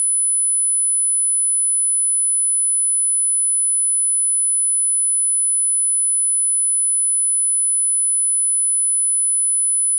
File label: Brilliance 10000hz